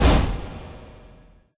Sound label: steps; fnaf; goldenfredbear